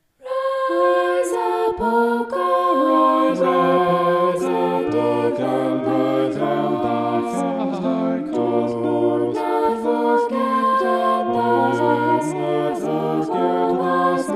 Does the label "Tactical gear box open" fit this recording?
No